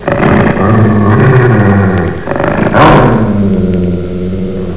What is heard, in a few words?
growl